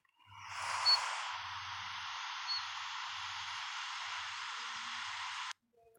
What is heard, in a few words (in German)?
taigazilpzalp